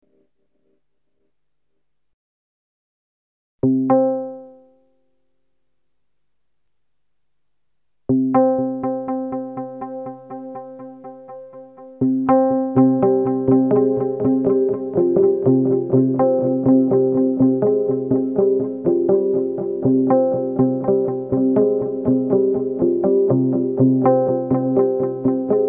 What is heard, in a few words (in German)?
Kurze rhythmische Toene Strymon DIG mit MicroFreak